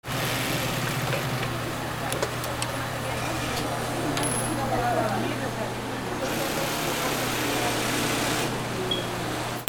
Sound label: INDUSTRY